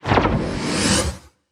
breaking line

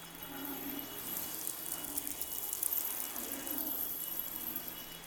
write record loop

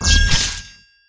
falchion

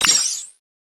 ui get target

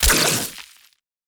atk ice prick

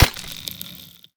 yoyo

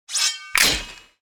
scabbard lock

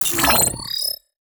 ui go first; autochess

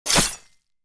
knife gore

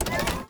switch

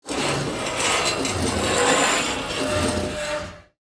scratch metal wall

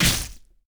slice

